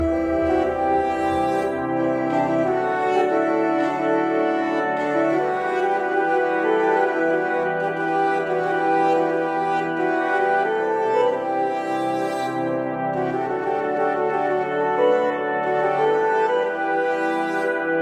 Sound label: super natural